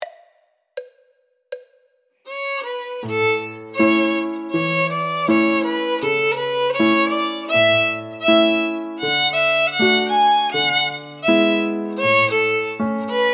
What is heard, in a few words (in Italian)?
violino e pianoforte